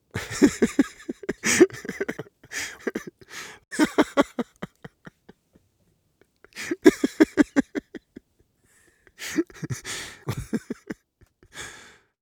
Male joyful giggly laughter; Laughs; Sound Ex Machina; GDC Game Audio Bundle

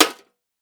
Impact VCR Hit By Plastic Tube; Impacts

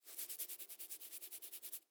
Horror Scary Head Scratching Head Fast

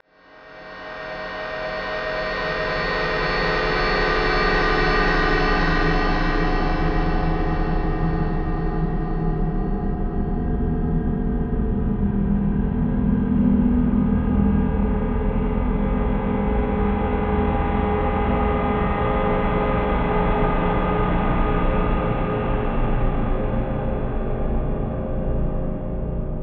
Quiet Tension; Adobe; Production Element; Imaging

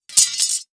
Sword Drop On Concrete Short, weapons; AdobeCC

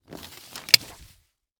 Umbrella Golf Umbrella Close; Sports

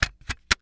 Technology Electronic CD Case Close

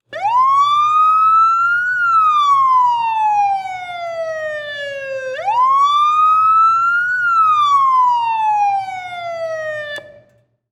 Auto Motorcycle Siren Engine Cooling; Transportation

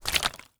Liquid Water Water In Plastic Bottle Moving

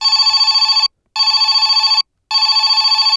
Technology Electronic Phone Ring Multiple

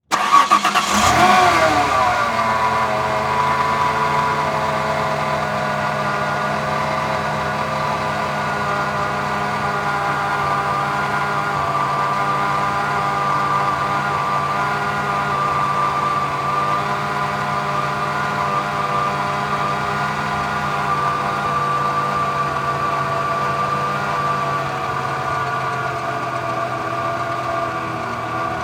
Truck Ford Ranger Start Idle Off Mic Under Tire; transportation

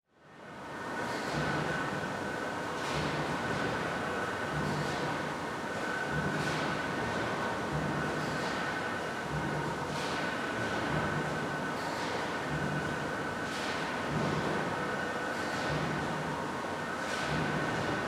Ambience Factory Hi Lo Interior; AdobeCC

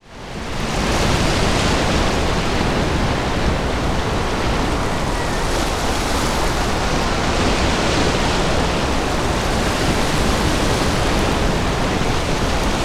Weather Ambience Storm Sea Waves Ocean Wind

